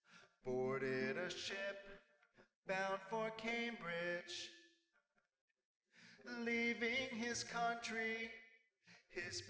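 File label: no drums, instantaneous mixture; SiSEC11; underdetermined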